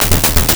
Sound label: Rhythm; 99Sounds; Mangling Audio; Data Mosh Loops; More Rhytmic